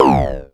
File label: Klone Dual Percussion Synthesiser tom; KLONE FX NW8